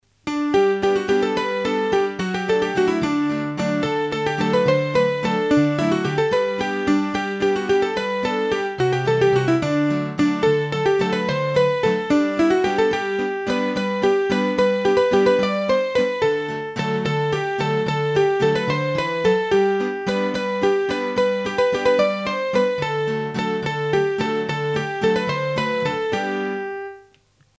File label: Valse, hurdy-gurdy; Massif Central